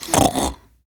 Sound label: Snores, Grandmother, Vocalizations; Warframe; Deimos